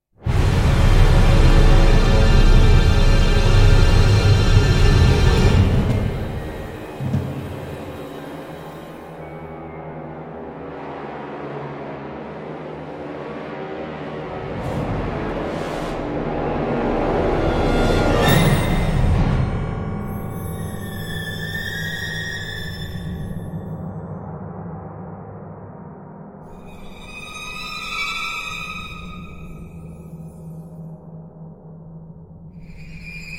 no choir

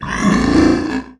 Hulk Moan; The Elder Scrolls III - Morrowind

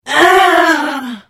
Hit; The Elder Scrolls III - Morrowind

